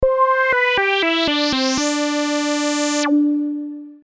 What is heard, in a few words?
Env VCF after